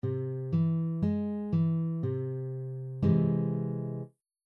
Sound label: triad c augmented